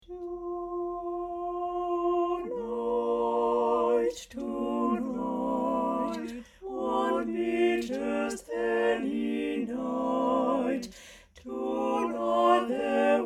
sopranes; version chantée

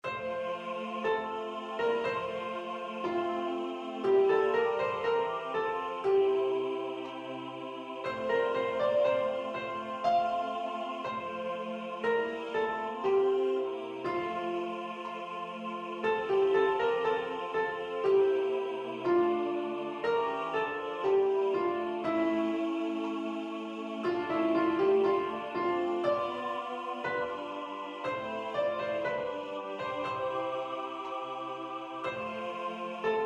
Whole Tone Lower Soprano Piano

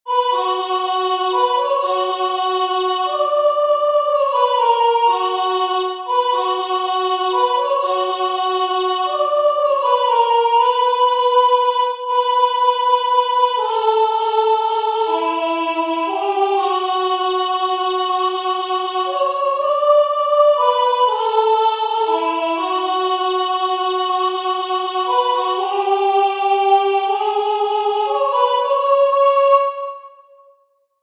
soprano

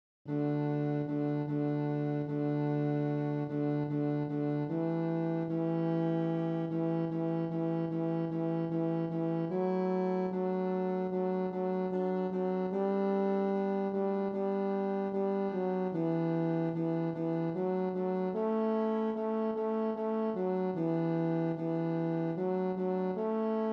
basses; Pater Noster

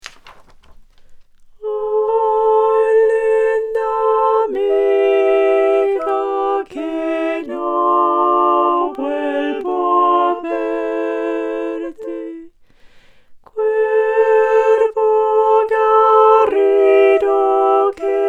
Alto; Ay Linda Amiga

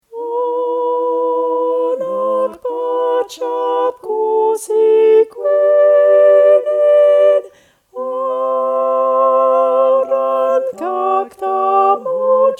tenor; Hanacpachap Cussi Cuinin

